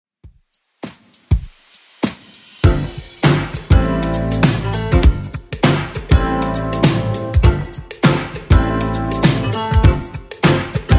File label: 80s Redrum 100 bpm